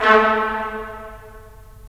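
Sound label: brass trumpets staccato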